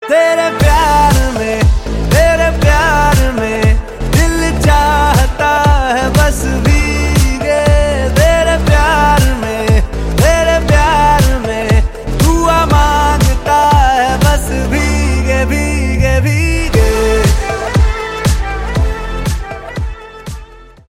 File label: lovely soft song tone ringtone, bollywood ringtones